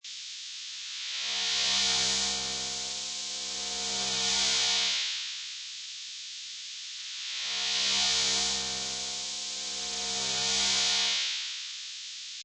Strange Emissions Loop, Scanner, blue light or space ray, constant; Strange Emissions 01: Scanner, blue light or space ray, constant signal, slowly fluctuating.